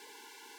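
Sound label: ORGAN Flute noise, Farfisa; Keyboards of The 60's and 70's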